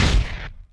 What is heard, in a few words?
Pop Brick; Ricochet